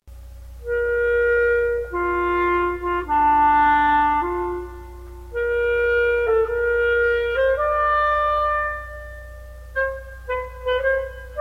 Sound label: Allegro Moderato for Solo Clarinet; Romeo et Juliette Op24 No22; V Bellini arr Klose